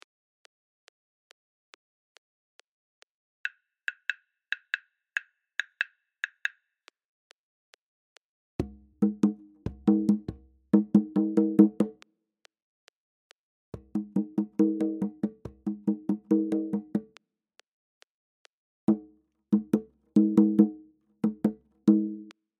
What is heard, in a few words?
Diansa 140bpm; Afrika